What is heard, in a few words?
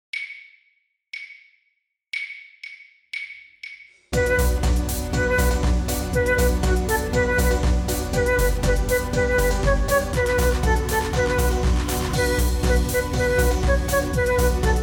120 bpm